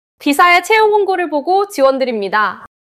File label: neutral long neutral